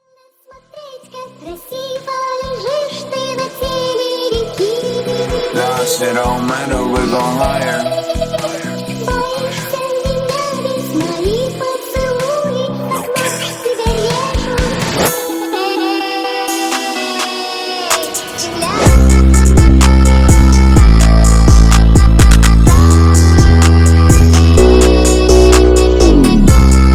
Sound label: Phonk remix